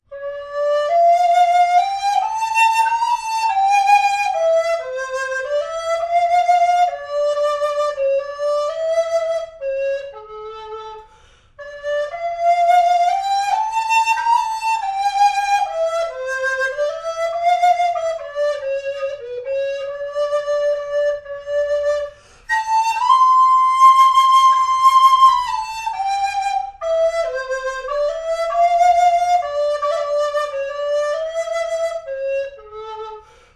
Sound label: green sleeves alto, recorder; Tategoto